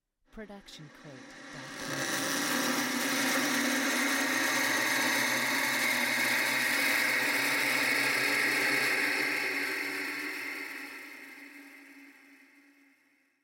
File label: horror noise; soundscrate